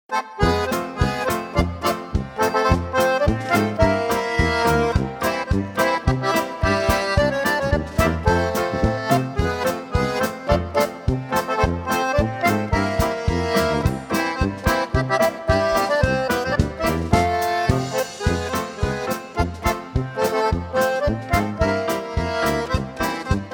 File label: Polka